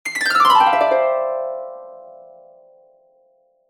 harp, jingle

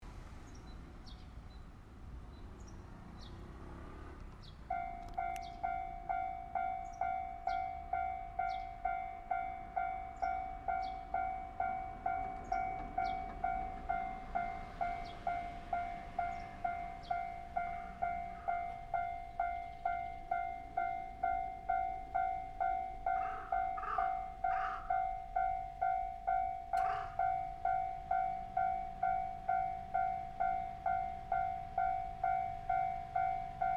Railroad crossing; Ikeno Matsubara

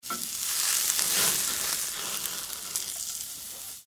fillet steak

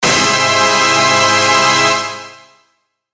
shock

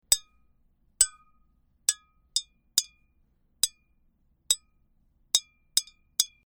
hitting bottle

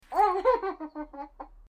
baby laughing

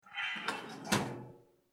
toaster oven open

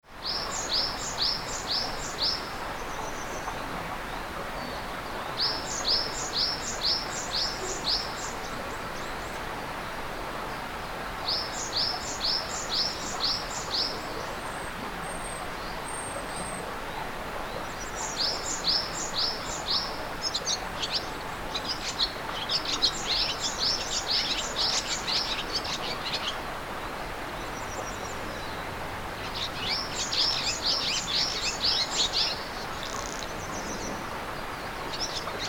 bird morning

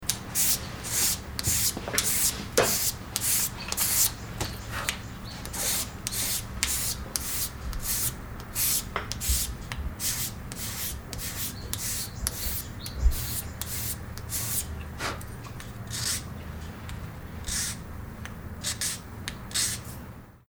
craftsmanship

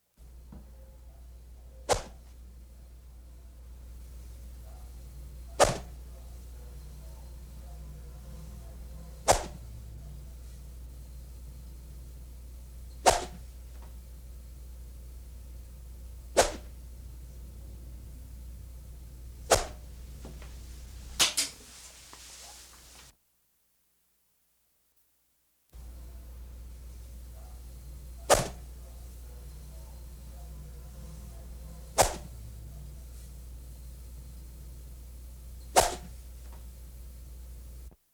japan arrow

